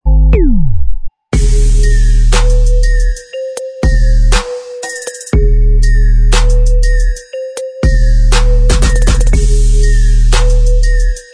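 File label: instrumental, backing tracks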